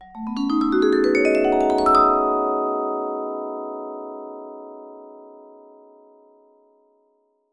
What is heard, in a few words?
VIBE LICKS